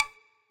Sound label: game Settings Button Click; berry burst